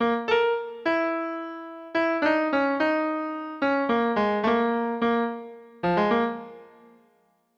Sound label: ringtone